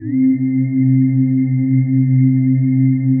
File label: PAD, ATMO PADS; Best Service; XX Large